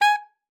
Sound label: G#5, alto sax; Xsample VOL-2